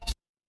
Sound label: ui beep